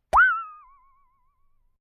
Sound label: Hand Saw Hit And Metal Wobble Impact Cartoon; Tom Hutchings